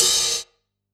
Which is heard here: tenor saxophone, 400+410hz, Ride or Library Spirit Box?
Ride